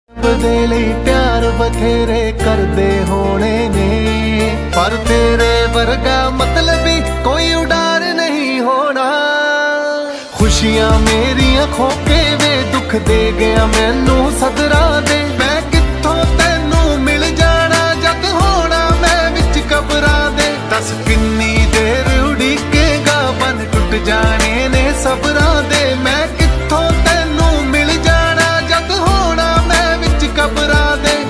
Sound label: Punjabi Tune Ringtone